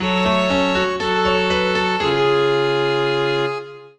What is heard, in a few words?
gameover; Adventure Bar Story; nintendo 3ds